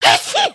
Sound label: sneeze